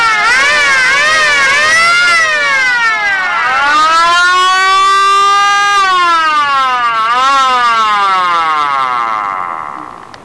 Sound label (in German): rotationssirenen